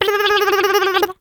Caramel Miss Sound; Jolly Battle

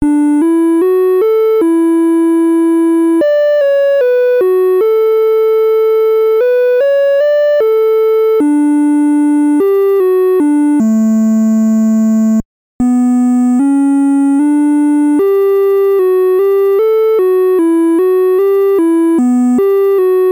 Ocarina's Final Melody; EarthBound Zero; NES

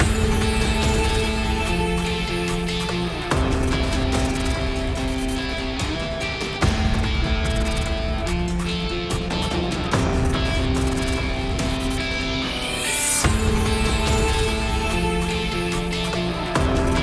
Music Combat

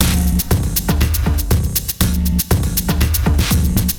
Music Combat

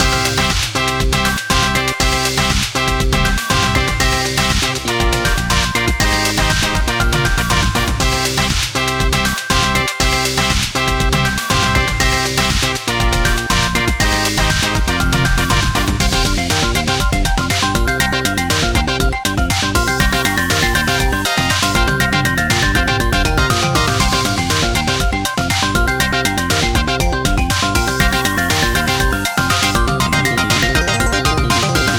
menu aha

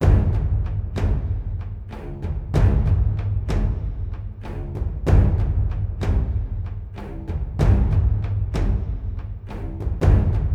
Waiting; Warhaven

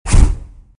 Boost

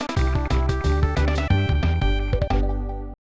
Stage Clear (Waterfall); Donkey Kong Country 3; SNES

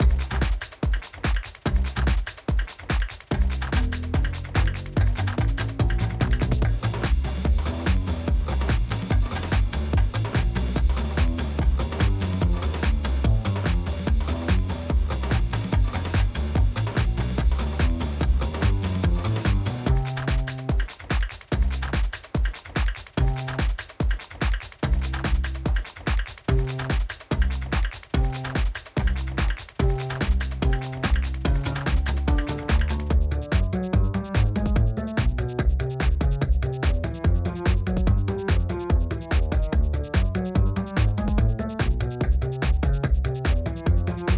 Forest Chill

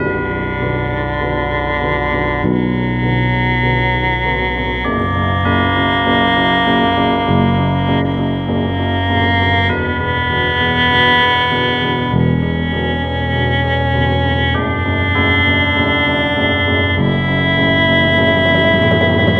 Tension Loop 99bpm 8bar; project zomboid

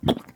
Toilet Plunge

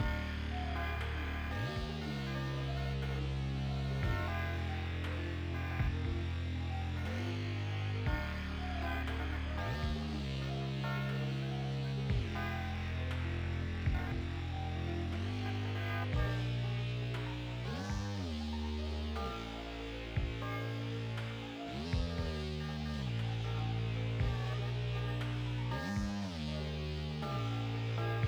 Elevator

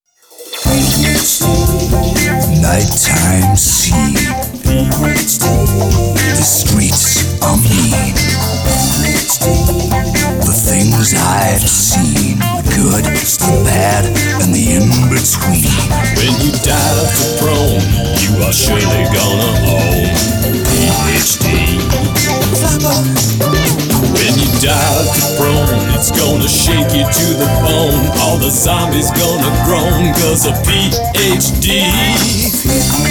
Perk-a-Cola Jingle; PhD Flopper

